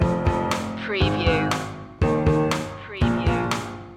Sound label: reykjavik stinger